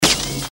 high voltage

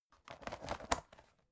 folder open hard

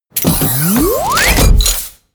promo pad

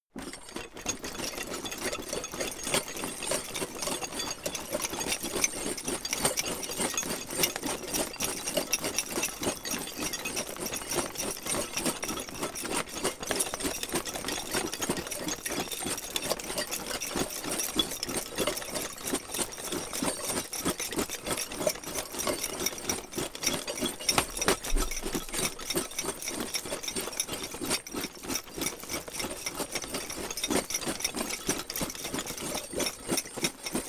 troops leather metal run

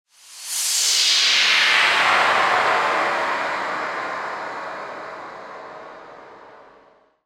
swoosh high long

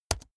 keyboard laptop space press fast hard

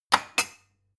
glass shelve wood

